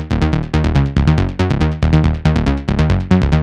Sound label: Root D# 140 BPM; Vengeance Samples; Special Sounds